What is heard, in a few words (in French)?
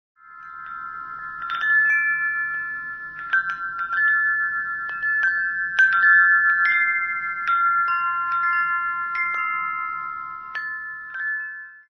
Carillon Grand; TOSCANE